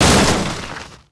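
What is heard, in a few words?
machine wreck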